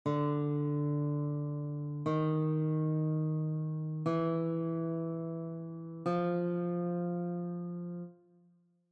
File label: D string, guitar; childrens